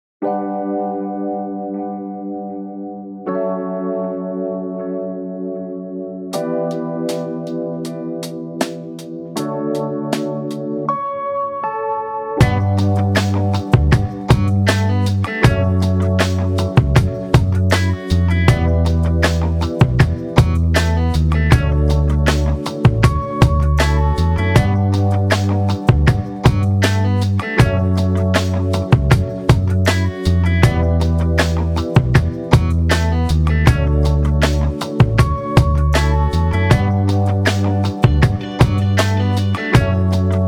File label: Funky